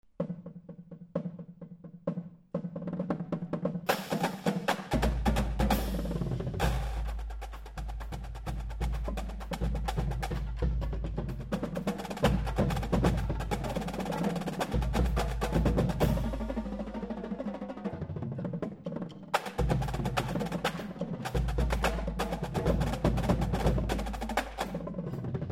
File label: Street Beat, Drum Corps